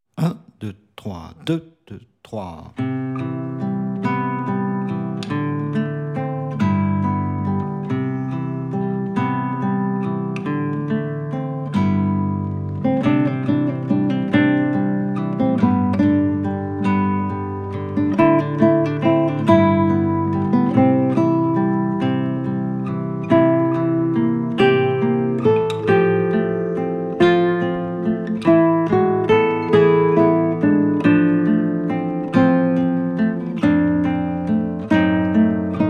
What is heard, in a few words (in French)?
exercice 140bpm